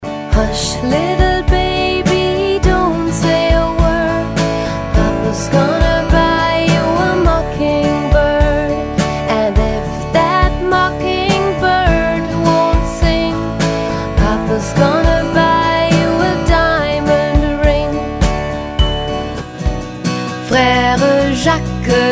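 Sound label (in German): Kinderlieder English French Spanish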